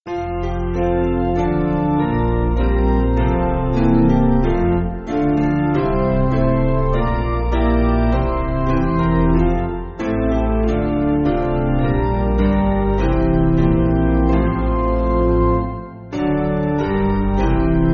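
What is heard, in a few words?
Piano, Free Music Backing Files for Churches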